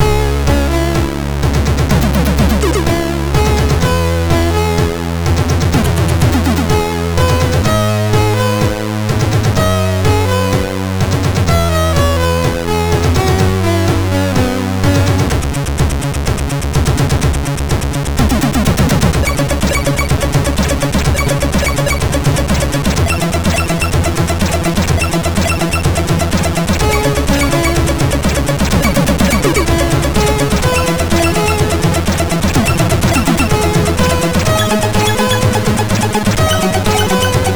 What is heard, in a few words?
remix, MOS6581R2; No Reality; Stenija